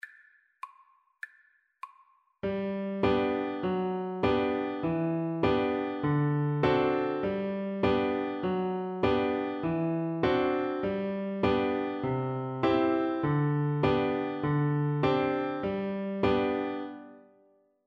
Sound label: ame ame FL kar, Flute